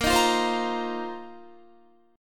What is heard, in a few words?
A sharp Suspended 2nd Suspended 4th A sharp, Guitar; 18,18,15,17,17,17; 4E,4A,5D,5G,5C,6F; strummed